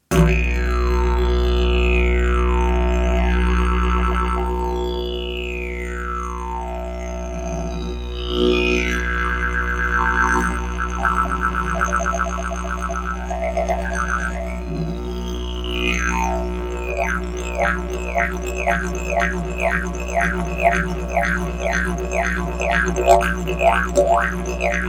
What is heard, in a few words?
didge